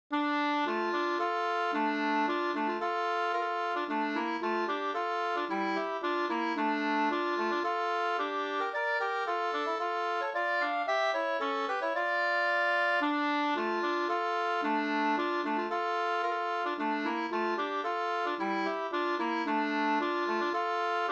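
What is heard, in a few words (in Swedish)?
polska, tvåstämmig; Tyndero; Ernst Ahlman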